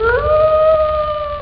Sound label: WOLF; ANIMAL